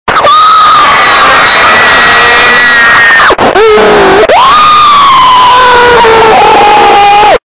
scream loud; roblox

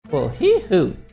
hee hoo; matt kohrs

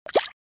splash; fancade

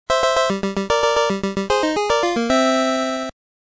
rbi baseball switch sides nes

